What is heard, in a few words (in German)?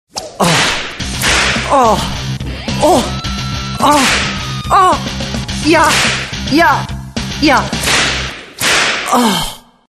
Orgasmus Sadomaso